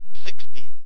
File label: Bubble System